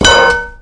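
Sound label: grass; Mafie 1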